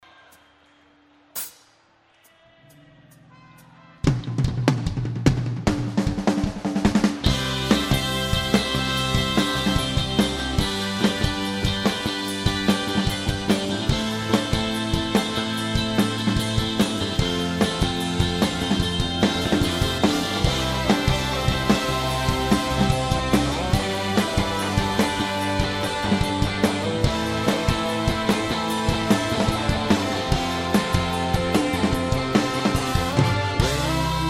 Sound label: Ring The Bells